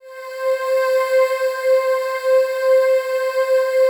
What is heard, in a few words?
PAD; Best Service; XX Large; Pads